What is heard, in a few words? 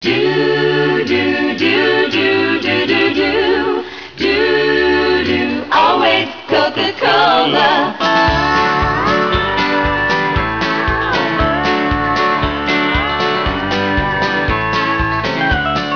country